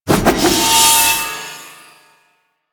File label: Staff Heavy Charge; Warframe; Duviri; Dax; weapons